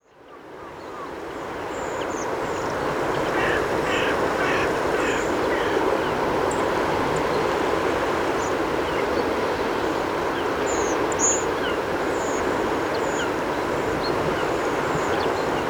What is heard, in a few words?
migrating songbirds; singwarte